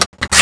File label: clip out, weapons MP5